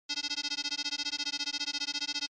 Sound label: Retro Anime System Mid